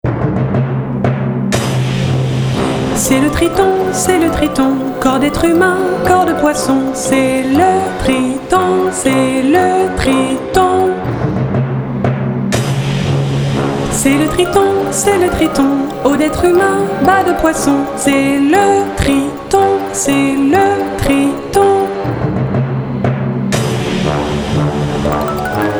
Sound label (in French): triton chant